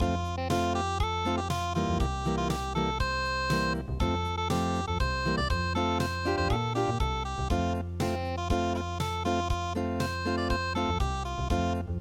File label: unpluged, midi; kintarou